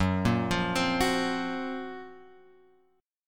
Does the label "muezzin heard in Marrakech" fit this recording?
No